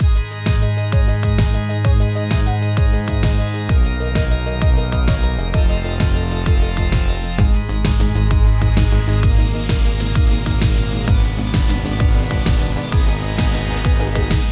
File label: Final Boss Battle Organ; Cadence of Hyrule